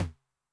MOD Noise, 909mod MID TOM; classic machines; MT - MID TOM

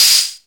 SP1200 NUKED; SP-12+1200; Greytsounds VOL-1

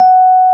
RHODES F#5, RHODES PIANO; EdgeSounds; Drum Mashines VOL-1

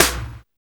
R+B SNARE; BONUS SN2

